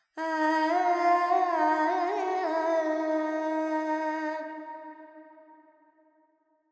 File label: Verb Phrygian, Vocals, Carnatic Female, Alaps; 70 G; Sample Banks